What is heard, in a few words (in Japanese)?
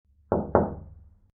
ノック音